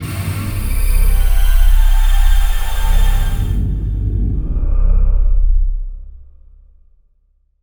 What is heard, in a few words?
pad, synth pads; Best Service ProSamples vol.36 - Chillout